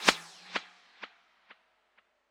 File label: Textures Tape Hi Speed; Impulse Responses; Time 25; Ableton Live 12 Lite; Hybrid